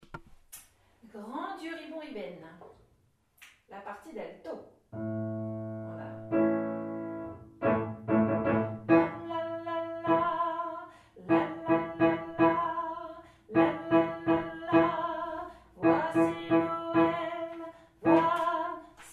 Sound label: Alto; Grand Dieu, Ribon, Ribaine